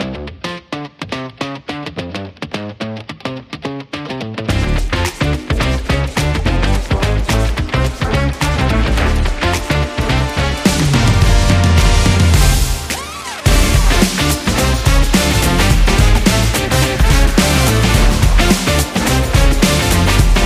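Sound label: Energy, rock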